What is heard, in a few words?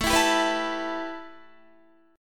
A 7th Suspended 2nd Guitar; A7sus2; strummed